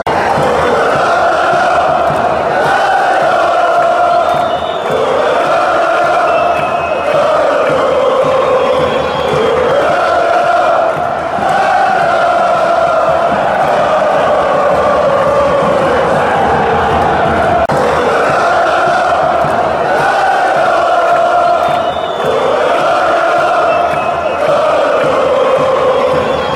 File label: lo lo lo lo lo, fanchants